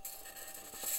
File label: mic, mars2020 edlcam